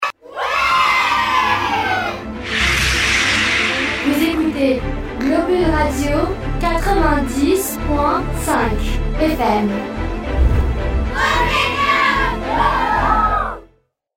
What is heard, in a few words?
jingle; Reflet 15; Reflets 15 (de Paris); colonie de vacance